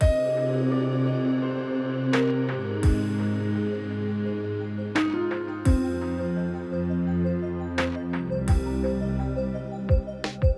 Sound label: Piano; BB 2HTC Samples Vol 4; Solos